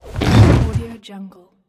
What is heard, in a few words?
body falling